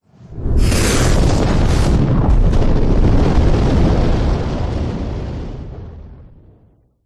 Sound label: explosion fire burst backdraft; zapsplat